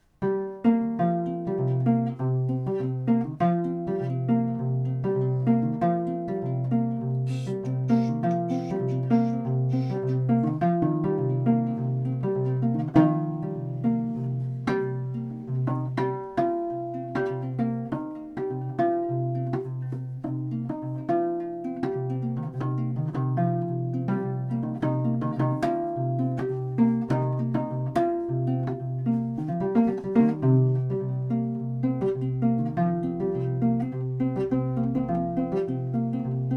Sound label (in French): petit ngoni 6 cordes